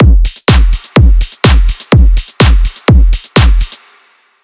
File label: future house drum only